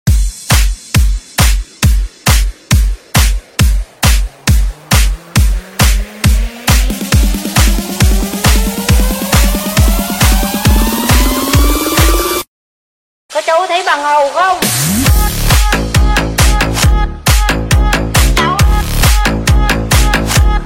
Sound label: remix